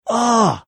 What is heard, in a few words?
uhhh